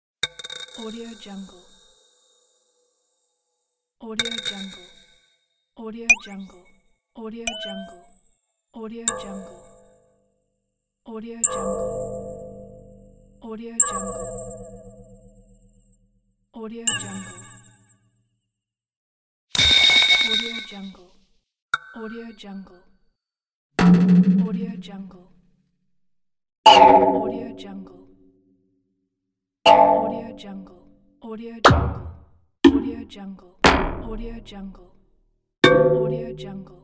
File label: funny cartoon hit; volkovsound